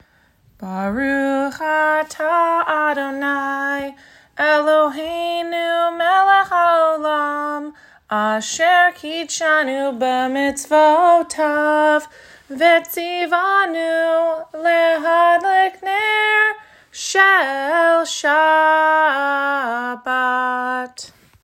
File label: Candle blessing